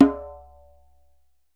DJEMBE, African Drum

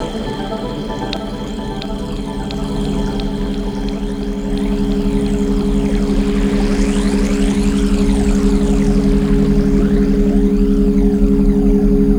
ambience

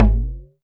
Perc, Alesis DM5

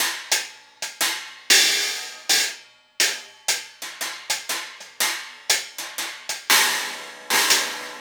Cymbal Pattern

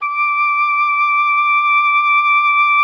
Oboe, D6; Xsample VOL-6

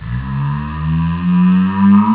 "yeeea"; jazz jackrabbit 2; M8

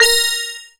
BELL-GONG, A#; Big Fish Audio

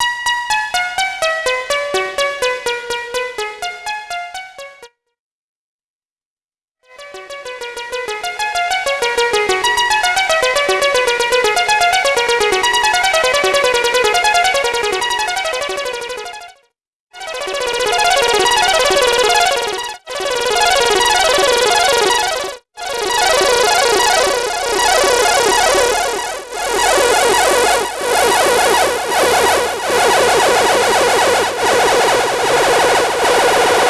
3RD SEQ, SYNTH; Keith Emerson Signature Series

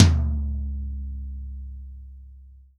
TOM, Real Drum Kit, POP KIT

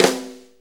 6-8 Snare Flam; Drum Tools; Sonic Foundry (Sony Creative Software); Loops & Samples Drum Tools; Flams

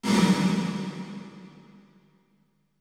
REV, ROOMS

